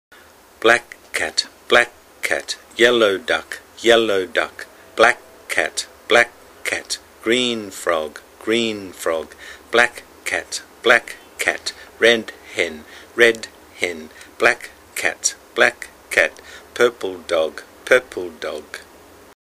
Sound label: The Black Cat Chant; English; Songs and Chants for P.1